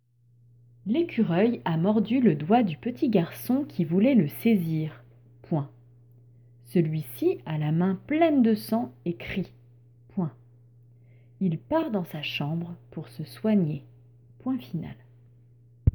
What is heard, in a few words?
Vocal